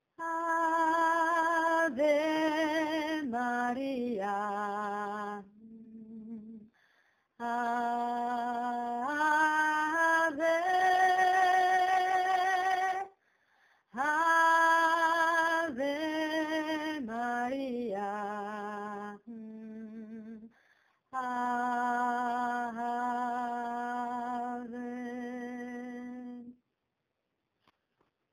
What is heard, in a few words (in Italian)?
voce tenori e bassi